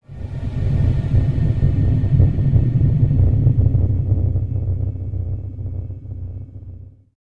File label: SPACE FX